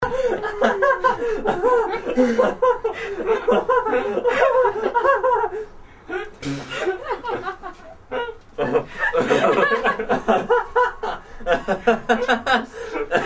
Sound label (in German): psycho lachen